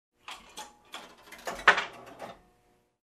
Slot Machine Insert coin

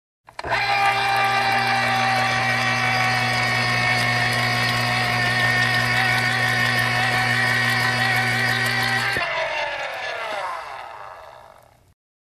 Automatic Mixer turn on

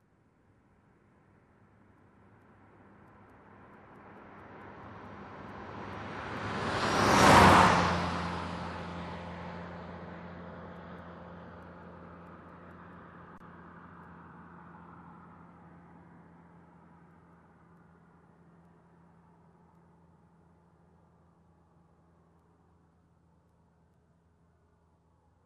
Car passing by fast

